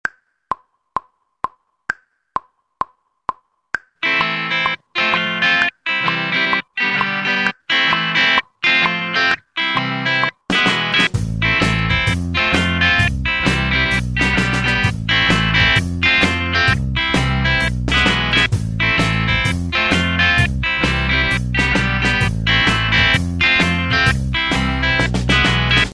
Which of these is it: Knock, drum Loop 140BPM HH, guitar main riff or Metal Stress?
guitar main riff